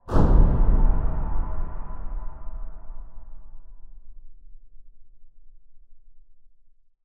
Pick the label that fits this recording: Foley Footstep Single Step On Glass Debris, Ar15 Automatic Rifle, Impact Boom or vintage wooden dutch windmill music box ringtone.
Impact Boom